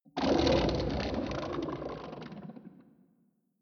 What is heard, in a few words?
beast slobber deep wet hard; kreaturen; comedy-cartoon; soundpool